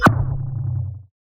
Woosh dry; افکت صوتی

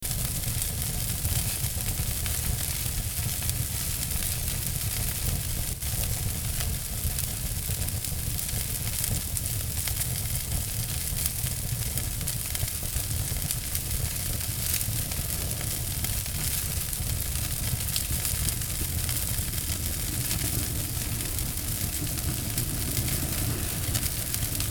fire; افکت صوتی

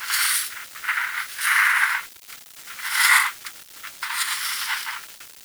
Drawing with pencil and marker

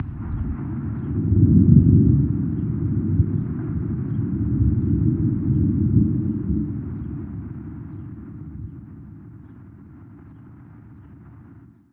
thunder and lightning

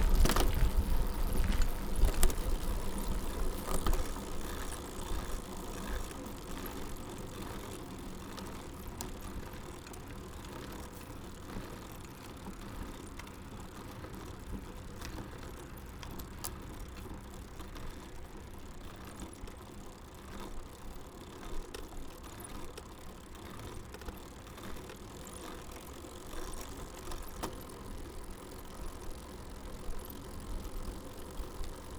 bicycle; افکت صوتی

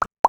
pop; افکت صوتی

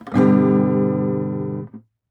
guitar

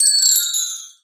magic

